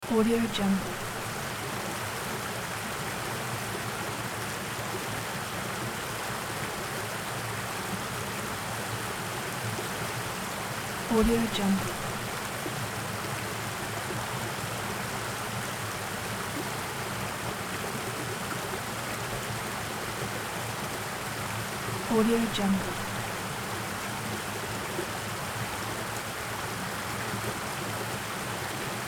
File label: river mountain stream large 10m distance; رودخانه کوهستانی; nature sounds